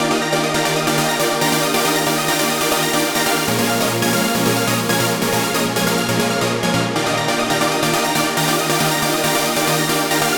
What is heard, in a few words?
Hybrid Advanced Trance Psytrance; midi wizard